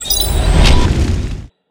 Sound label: item ring